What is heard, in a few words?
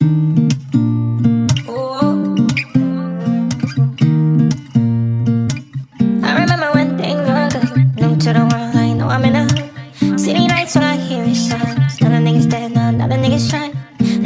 Nightcore Remix